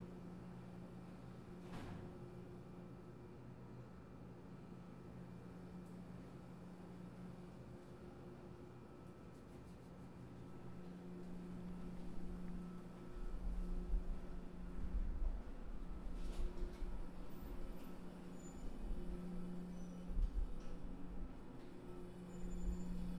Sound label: Tunnels